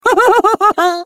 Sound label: defect laugh, defective turret; sp sabotage factory